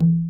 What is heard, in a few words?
CONGA, CONGAS; Best Service; Dance Mega Drums CD2